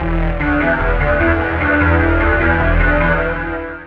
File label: Forest Synth Bass; torchlight iii; ps4